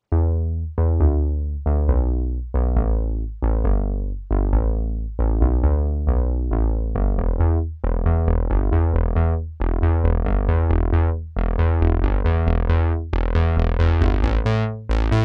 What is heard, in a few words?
Tight Bass